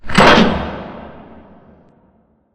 Robot Arm Track Start Close; Boomtown; Titanfall 2

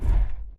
footstep jog; Titanfall 2

